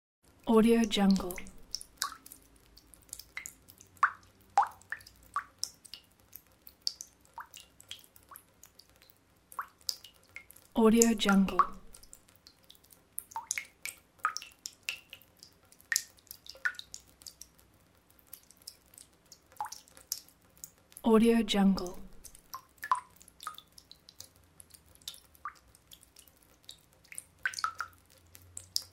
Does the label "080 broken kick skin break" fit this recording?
No